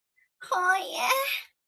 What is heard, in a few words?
hoooya